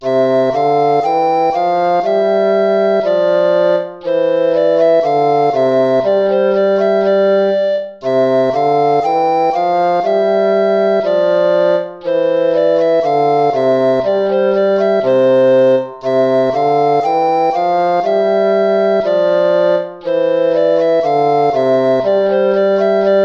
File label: gavotta; hook james